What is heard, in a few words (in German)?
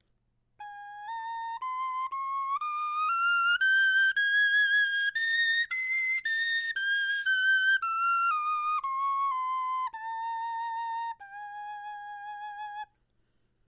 tonleiter